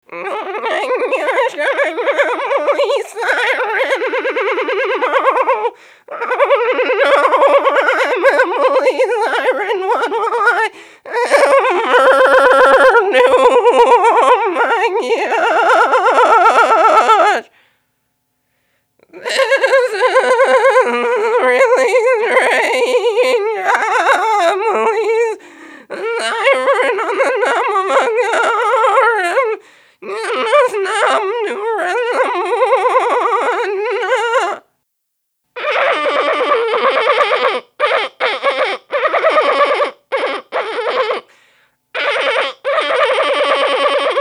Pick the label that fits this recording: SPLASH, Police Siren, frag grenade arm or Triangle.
Police Siren